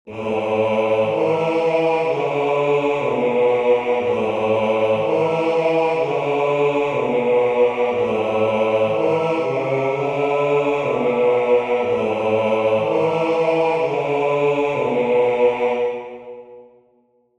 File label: bass